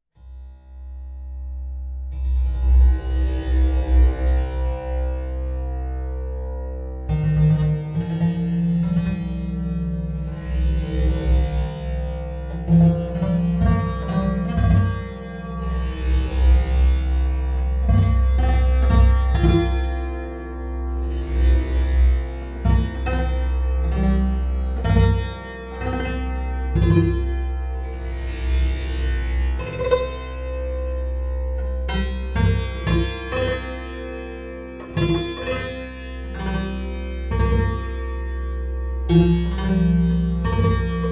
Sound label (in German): monochord mit gesetzten stegen